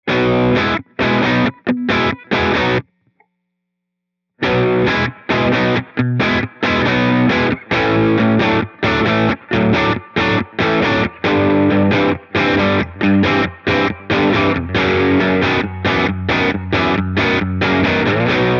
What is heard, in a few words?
Spring Bright Gibson, reverb